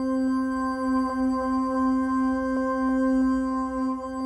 Kelele, Kelele Drones; World Percussion

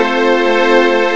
ORCHE; CMI Sound Library Rev 1.3; STRINGS

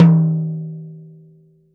PT1 MF, POP KIT

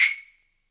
wood, Univox SR-95

